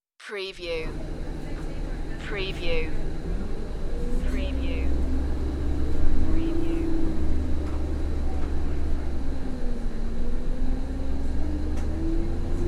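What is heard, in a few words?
Inside Bus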